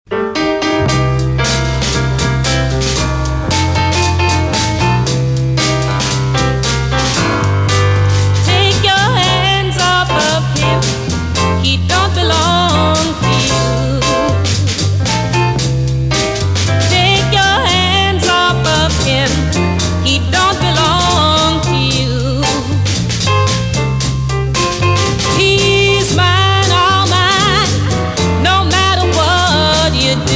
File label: Blues